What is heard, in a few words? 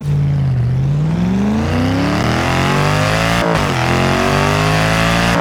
first, vehicles